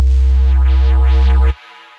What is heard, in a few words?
Sine Wobble, bass; Ableton; Skitter and Step